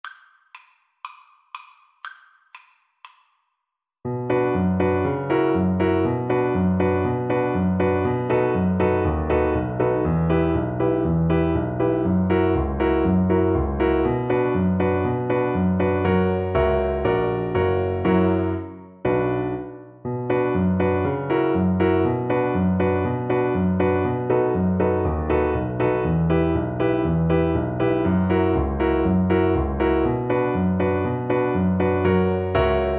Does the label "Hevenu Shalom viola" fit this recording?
Yes